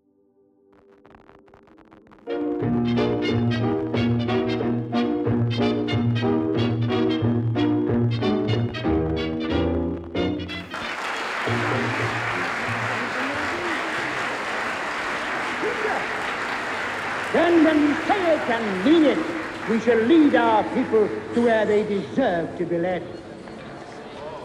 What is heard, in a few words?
Ambience, Music Hall; Aberdeen Concert Hall; Reverb from The Aberdeen Concert Hall